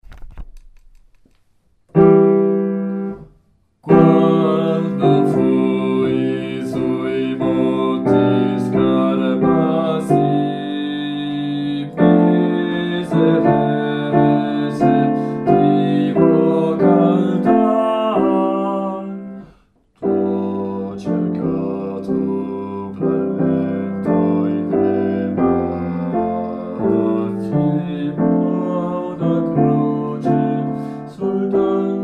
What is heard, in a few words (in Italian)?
bassi; monti scarpazi